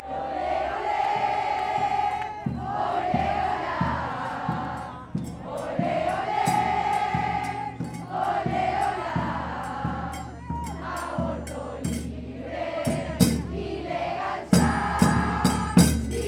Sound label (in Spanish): pañuelazo aborto legal